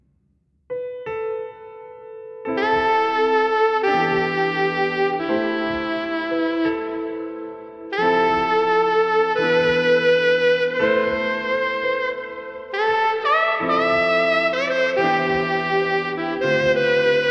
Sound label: Jazz relaxant